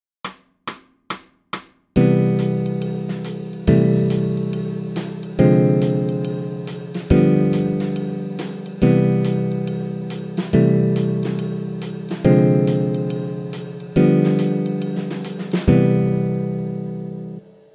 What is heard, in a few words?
2 5 1 Altered Simplified, bass